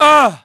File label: GRUNT; PHANTOM; Pulse Racer